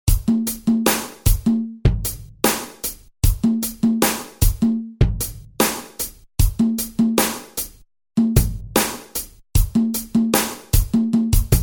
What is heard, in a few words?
instrumental lirika